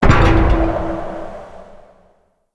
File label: Pull Beam Destroy; Encounters; Collector